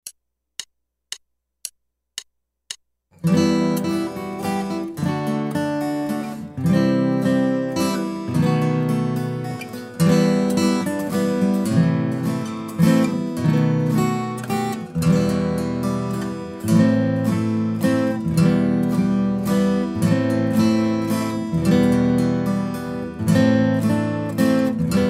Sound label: Guitar